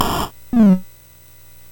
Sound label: Ducks Ahoy, MOS6581R4